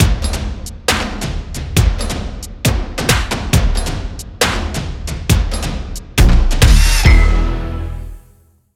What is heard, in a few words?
Template 136bpm; Wuerth